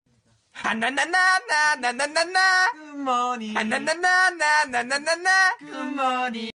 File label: Alarm